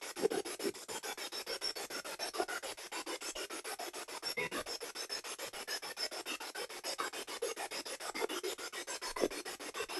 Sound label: Spirit Box; Demon Room